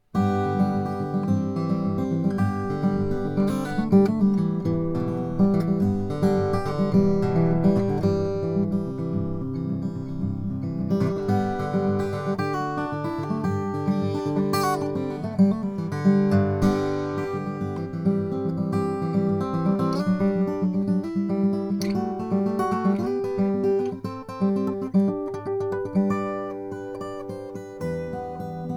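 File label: Santa Cruz H13 acoustic guitar; sunburst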